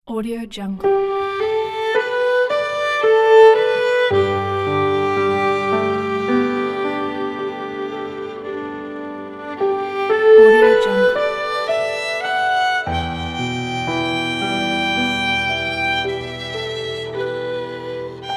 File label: wedding music